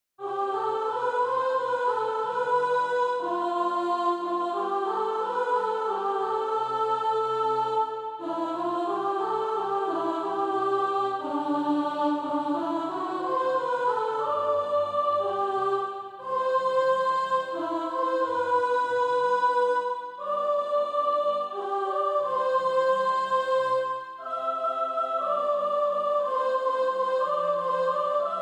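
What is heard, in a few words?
soprano, Practise Music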